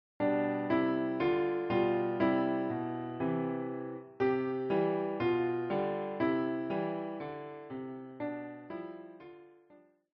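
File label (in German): Summ summ summ, Klavier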